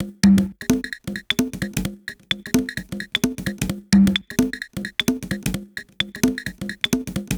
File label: Cn-Cl Loop 130, Perc Loops 130, Loungin House; Equipped Music